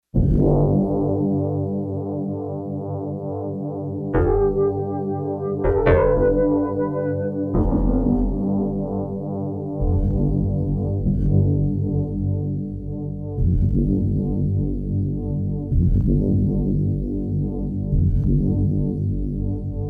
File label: Wallop, Yamaha TX802; Factory Patches; Voices